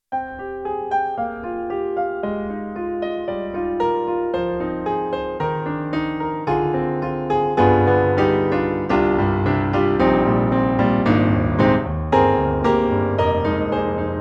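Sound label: KORG SV-1 Upright, piano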